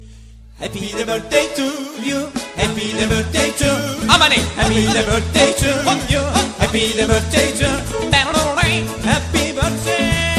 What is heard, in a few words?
Fun Birthday